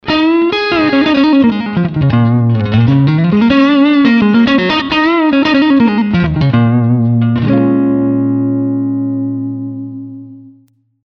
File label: stratocaster blues